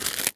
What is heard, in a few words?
Foley